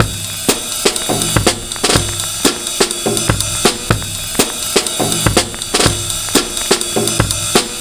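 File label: drum 123, 123bpm, Breakbeat; Best Service ProSamples vol.40 - Breakbeat 2; Sizzle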